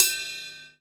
drum crash sound e key, Royality free crash sample tuned to the E note. Loudest frequency: 7095Hz; Cymbal; one shot